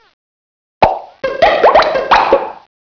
pops

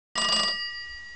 Ring

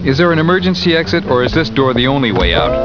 door out

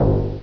player bounce

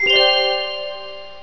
Chime

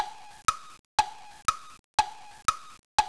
Tick Tock

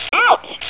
ouch

